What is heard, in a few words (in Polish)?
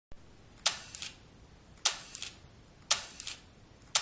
leica m8 test migawka